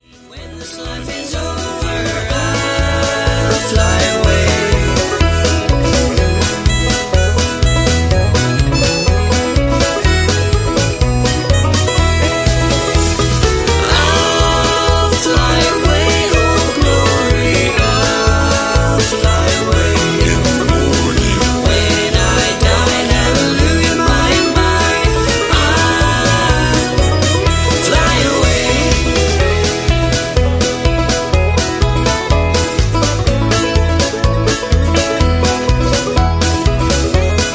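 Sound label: Gospel Medley Instrumental with background vocals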